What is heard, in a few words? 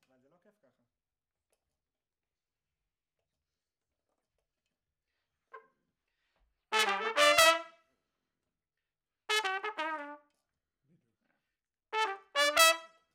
Trumpet Room